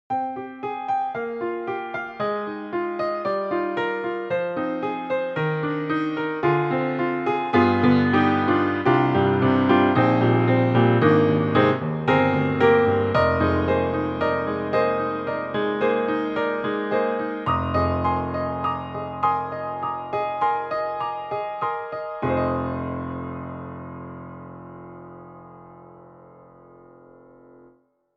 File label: whispering piano; IK Multimedia; SampleTank2.5XL